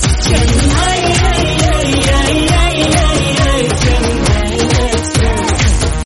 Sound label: Message Tone; Chennai Express